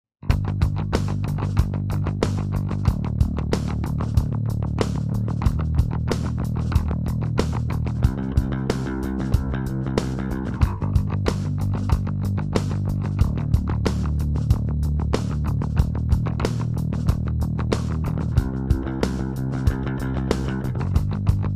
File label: drums bass